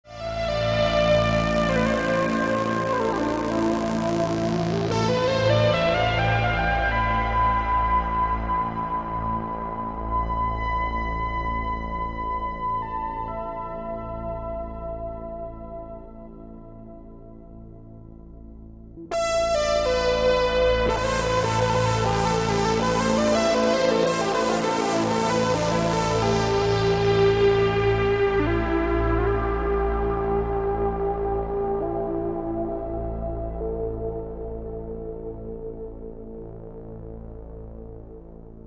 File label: MaxiKorg; Korg 800DV